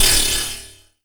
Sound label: Oneshot